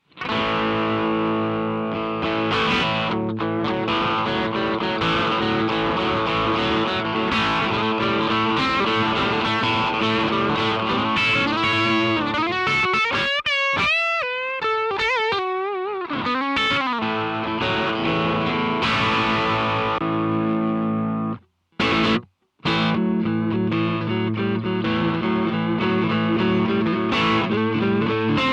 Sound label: SDBR 1 Duckbucker drive